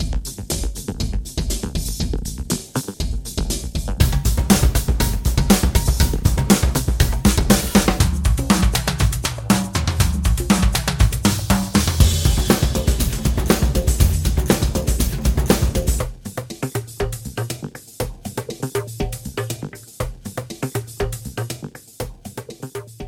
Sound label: Robo Pop; The Loop Loft; Track Stacks Vol 1